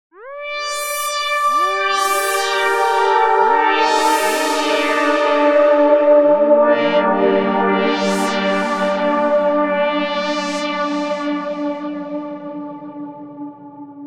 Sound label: Midnight Buzz; NI Carbon; soundline